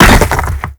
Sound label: rock fall break